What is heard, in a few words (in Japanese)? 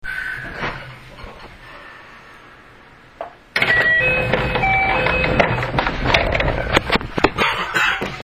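ドア開け, 223系2000番台, ドア開閉時に「カラカラ・・・・」となるのが特徴; 新快速の130km/h走行を開始させたJR西日本のパイオニア。; 製造から２０年。古さが否めないがまだまだ現役。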